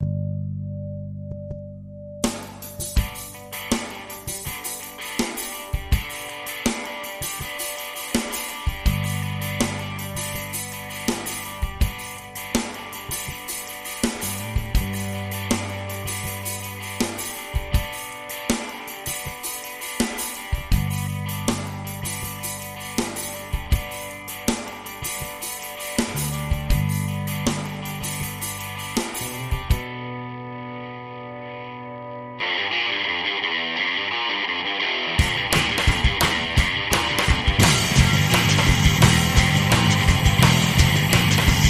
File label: Instrumental